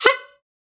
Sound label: Bycicle Horn; SoundsCrate